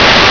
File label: NOISE